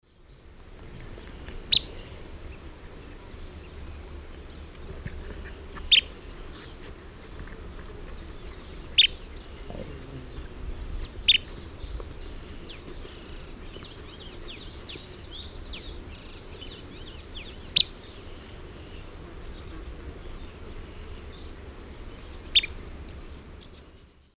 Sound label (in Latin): Charadrius collaris, feeding calls; S. America; Eastern Lowlands